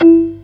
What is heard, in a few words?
PERC; B3 FULL